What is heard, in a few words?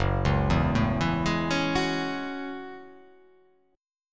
F sharp Minor 9th F sharp